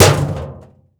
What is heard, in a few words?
metal clang